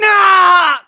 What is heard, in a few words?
Smashed; Link smashed off the screen